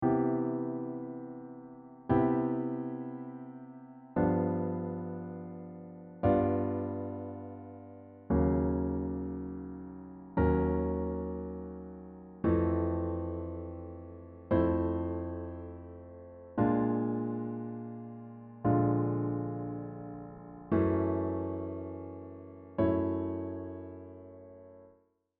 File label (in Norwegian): spredt harmonisering eksemp